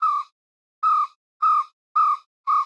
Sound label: Amb Env Bird-Coo; Botanist